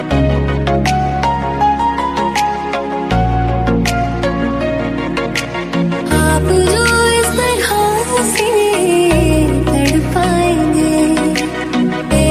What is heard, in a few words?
Romantic Hindi Female Song Ringtone